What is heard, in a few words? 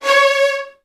Virtuoso Strings, 16TH; 24 VN; ILIO; Kirk Hunter